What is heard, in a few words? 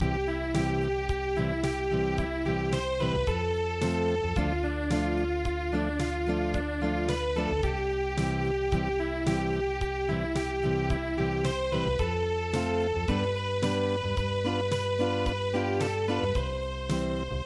Unplugged, midi